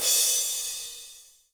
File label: soft hit finish